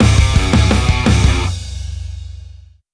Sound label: Mission Failed! Jingle; the simpsons hit run; ps2; Street Racer Anthem - Jingle 1